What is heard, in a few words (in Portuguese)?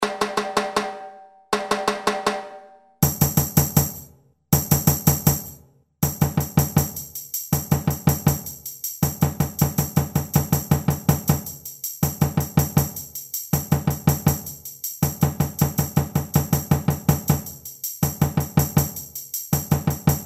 samba reggae shaker sourdo